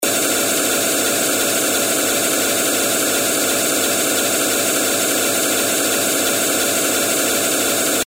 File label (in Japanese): エレベーターが動く音 近未来